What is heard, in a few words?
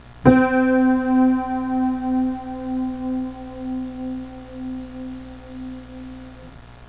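c4 piano, notes played from a piano; piano on campus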